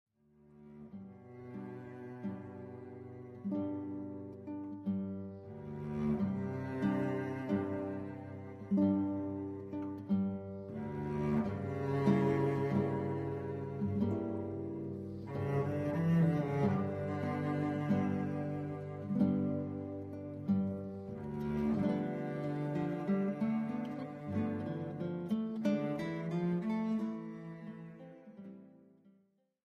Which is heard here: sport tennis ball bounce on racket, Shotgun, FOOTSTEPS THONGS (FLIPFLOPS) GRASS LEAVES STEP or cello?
cello